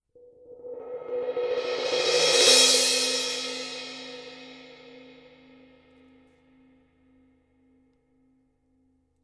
Color Sound 900 Black Crash Paiste

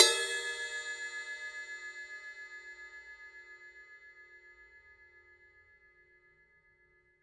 PST 5 Medium Ride Paiste

